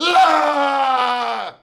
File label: male scream; Voice; Human